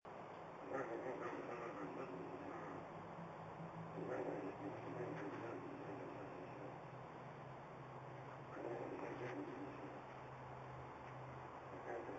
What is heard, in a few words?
sounds on a bus